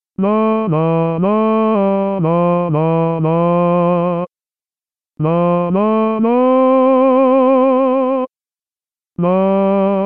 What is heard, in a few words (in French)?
sarabande basses; mesures de 26 à 32